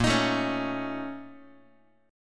A sharp Minor Major 7th Double Flat 5th, down, Guitar; strummed; x,6,6,7,5,x; 4A,4E,5A,5D,5G#,6C#; JGuitar